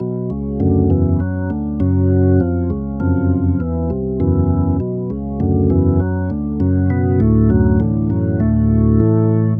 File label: loop mountain